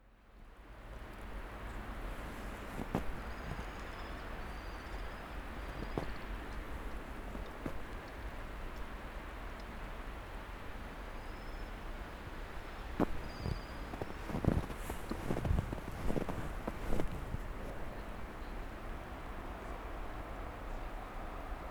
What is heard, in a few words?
bird